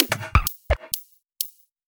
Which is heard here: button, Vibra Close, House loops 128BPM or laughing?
House loops 128BPM